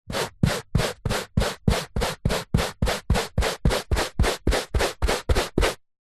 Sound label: brush hand held sweep carpet fast multiple; household; zapsplat